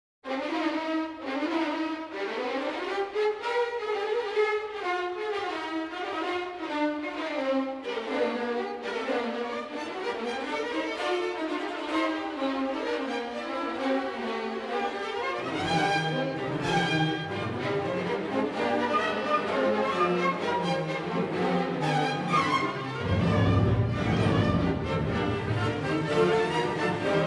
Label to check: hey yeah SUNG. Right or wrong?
Wrong